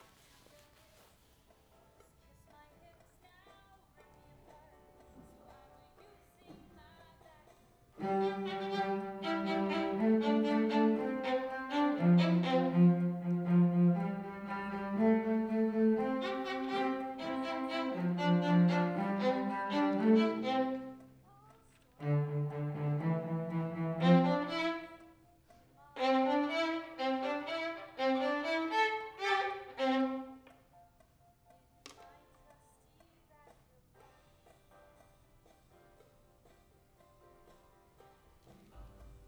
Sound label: Violin